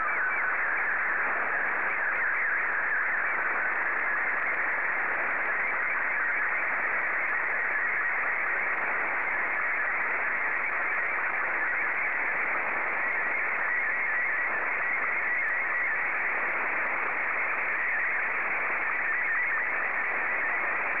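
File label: SSTV, Martin 2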